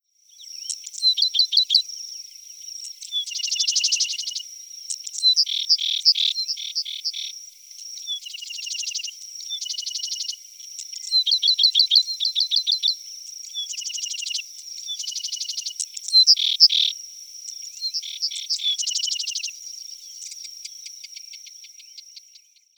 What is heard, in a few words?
Sedge Wren